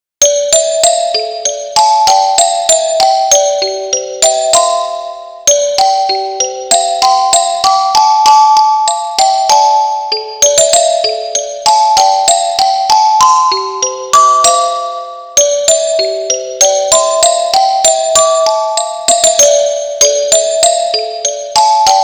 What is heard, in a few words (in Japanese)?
砕月オルゴールアレンジ; ヤンデレの伊吹萃香に慕われて